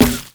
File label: infected cleaver; mundo